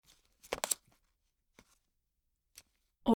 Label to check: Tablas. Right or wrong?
Wrong